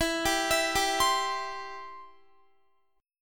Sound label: E-Minor Sharp 5th, Guitar; Em#5; arpeggiated; x,19,19,21,19,20